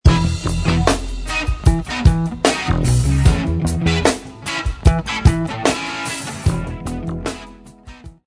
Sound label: ionian funk